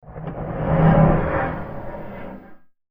Spooky Horror Scratch Transition; Scary Soundscape; Scary Soundscapes (Sound Effects:Horror; CraftedSignals

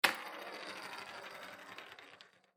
Office Marker Roll On Desk Left To Right; Pens; Offices; SFX Bible

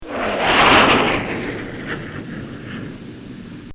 Fa18 Hornet Fly By

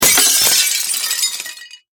Impact Glass Break Large Smash; Materials

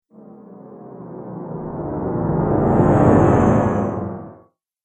Strange Falling Horror Sound; Scary Soundscape Sound Effects; Andrew Fleming

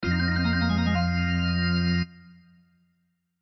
Organ Blues Fill; Chord Fourth; Keyboards; Blastwave

